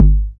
fat kick one shot g key, Royality free kick drum sound tuned to the G note.